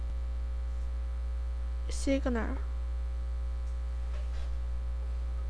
signal

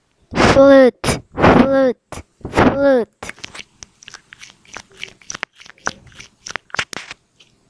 flute

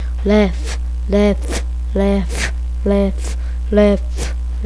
laugh

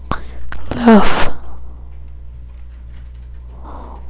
laugh

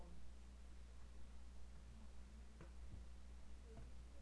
yawn

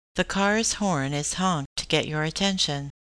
horn

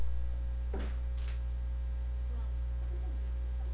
wheel

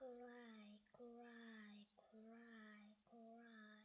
cry

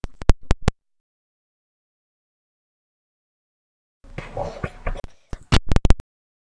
pub

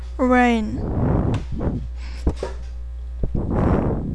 rain

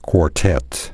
quartet

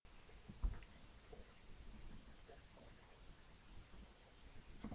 giggle

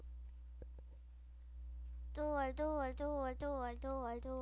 door

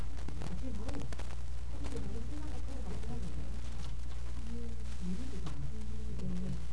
charge

